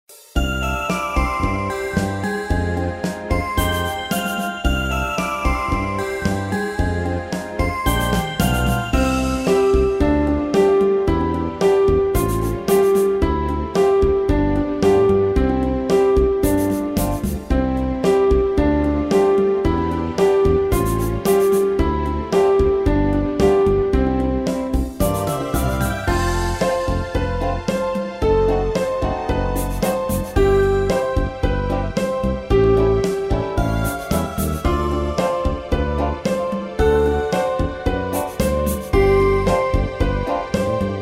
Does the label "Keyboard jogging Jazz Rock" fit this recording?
Yes